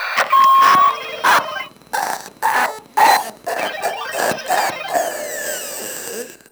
Proto Infested Runner Idle; Warframe

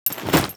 Generic Foley; Warframe; Temple

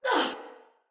Pain, Female; Battle; Barks; Dialog; Sinecure Soldier Female B

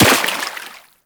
Operator Run Water, Footsteps; Warframe

